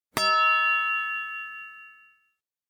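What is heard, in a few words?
electronic cold kaan; anemoi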